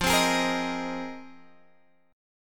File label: F Minor 11th, Guitar Open G